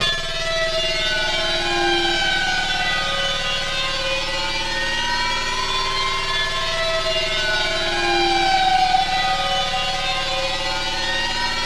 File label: Pad, PsyTrance